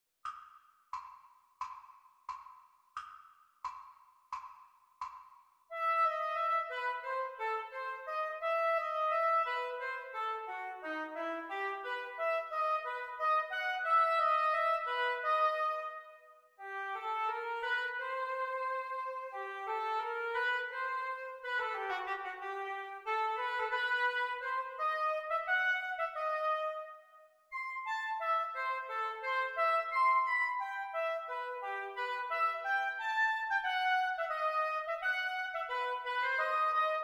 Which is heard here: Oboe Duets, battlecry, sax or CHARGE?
Oboe Duets